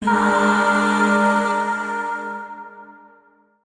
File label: Shawzin Min Chord L; Warframe; Zariman; Void Shawzin; Chords